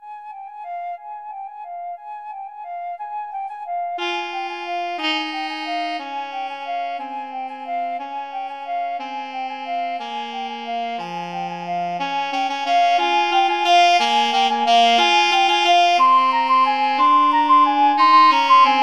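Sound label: alto sax flute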